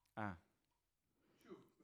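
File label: Vocal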